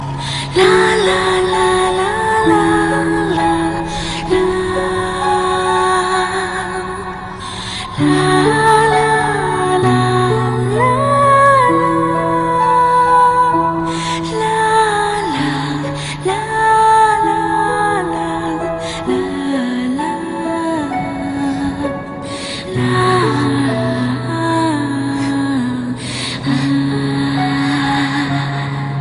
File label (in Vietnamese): Nhac Phim